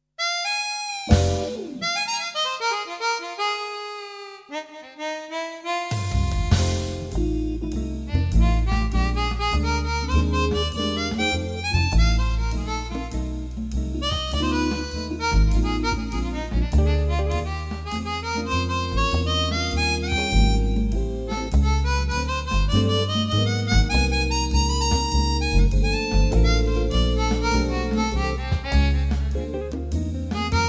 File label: jazzy blues